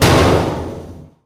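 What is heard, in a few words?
panels impact large, metal; physics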